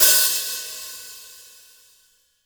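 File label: JAZZ KIT hi hat open, Drums Acoustic; AKAI; JAZZKIT 1; 90s sample CD; USB Soundscan vol.10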